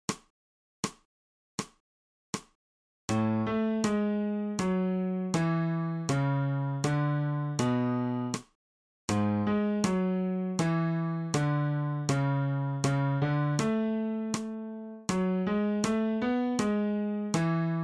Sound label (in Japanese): 新フレットレス